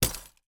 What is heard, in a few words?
impact glass debris in cardboard box hit floor